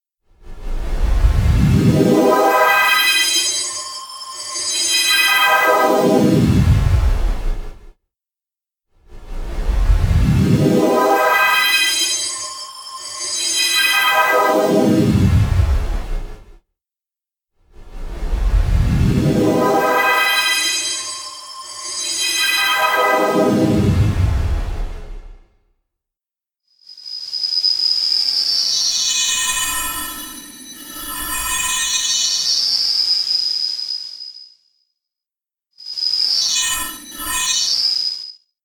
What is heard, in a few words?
Retro Video Game Sweep